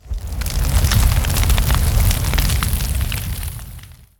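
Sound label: Flame Breath Sweet; Evolve; Goliath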